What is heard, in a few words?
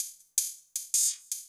LOOP, 160 BPM, Drum & Bass